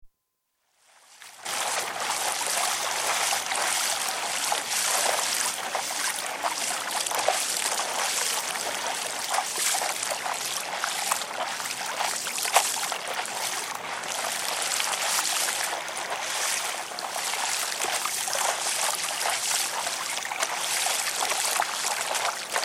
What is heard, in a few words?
Windsurfing, constant run, with creaks & clunks from mast, some very distant motor boats (Recorded on sailboard); Miscellaneous Sport; BBC